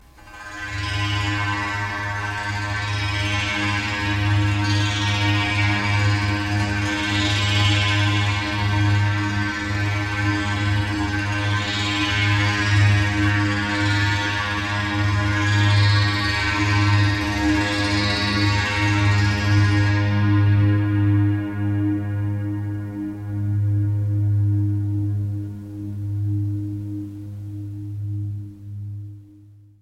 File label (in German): Monochord